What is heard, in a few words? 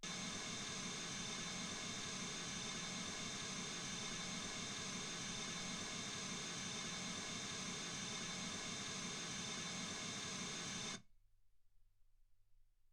mls16 48kHz